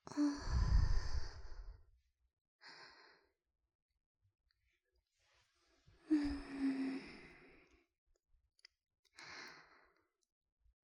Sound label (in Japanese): 甘噛み・耳舐め; 捨て猫ダウナーをとことん愛でたら悶絶するほど幸せになる音声